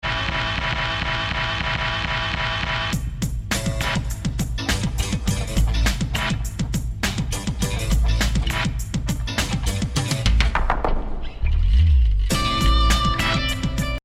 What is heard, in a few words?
Funk Interlude